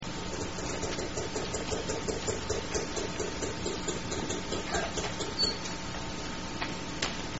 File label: echo chain whistle, transient killer whales; salish sea